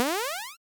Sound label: Retro Jump